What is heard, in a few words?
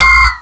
switch on